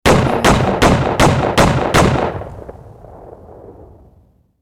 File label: six shot hand gun fire